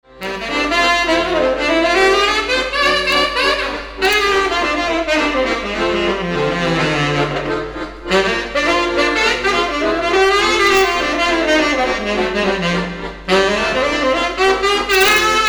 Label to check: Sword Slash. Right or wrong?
Wrong